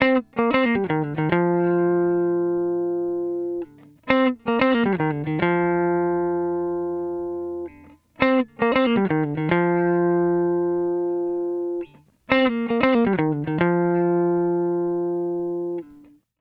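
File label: 117 Cm DISCO guitar PICK, 117 BPM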